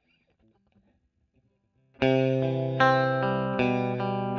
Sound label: E.GTR Royer